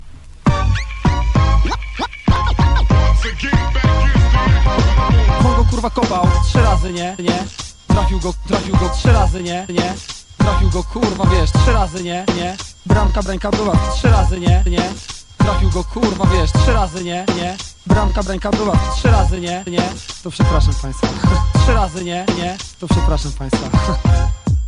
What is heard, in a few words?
Rap